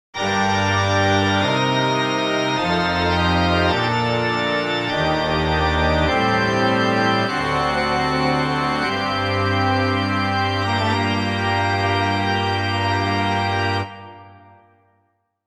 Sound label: organ